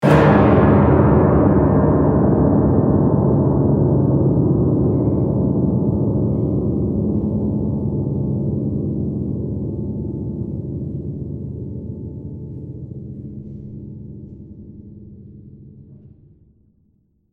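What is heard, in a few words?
Music Piano Hit; Seattle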